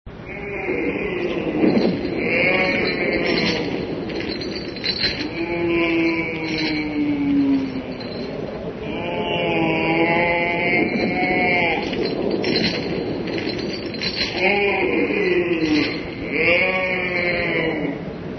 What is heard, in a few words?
chains groan